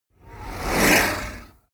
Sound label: whoosh speaker harsh